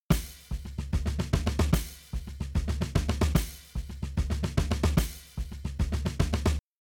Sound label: Drums Shuffle SN HH; WDR2